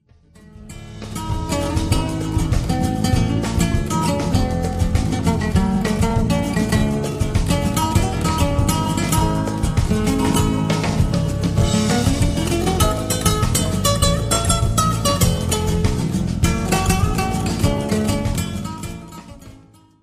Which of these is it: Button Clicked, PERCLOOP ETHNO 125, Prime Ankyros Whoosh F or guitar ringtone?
guitar ringtone